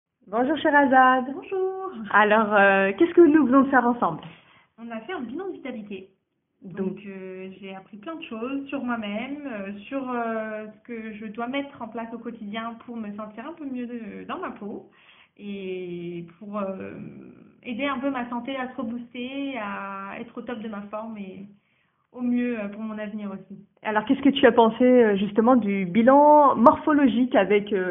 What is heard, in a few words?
vocal